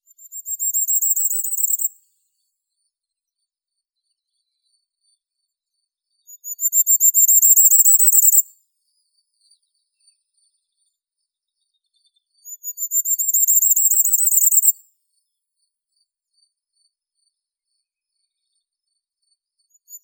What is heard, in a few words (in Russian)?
korolek krasnogolovii regulus ignicapillus